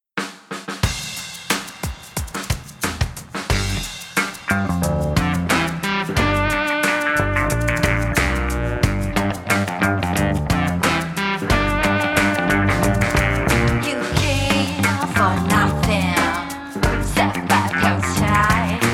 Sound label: Growling Lady Power